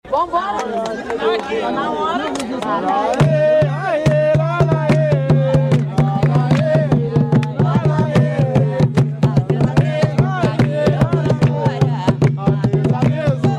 jongo de tamandare adeus adeus

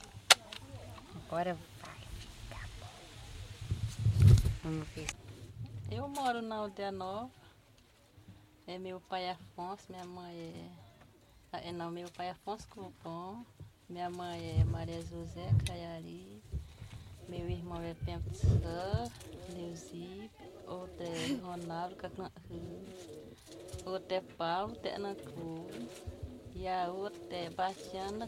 timbiras

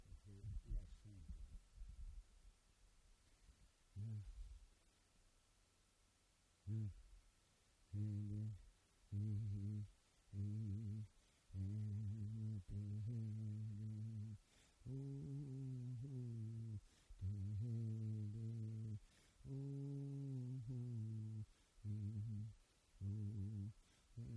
timbiras

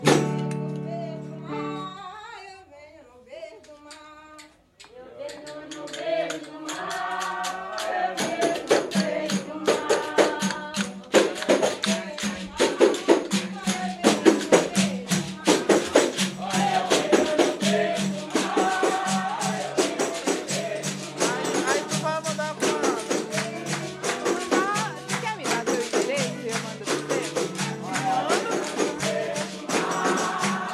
fanti baiao de princesas eu venho; Baião de Princesas é um ritual especialíssimo e único que acontece apenas uma vez ao ano

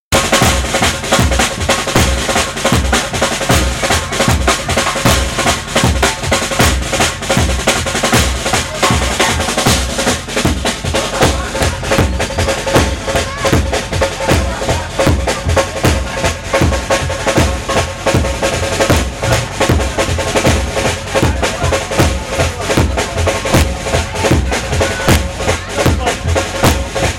instrumental carnaval

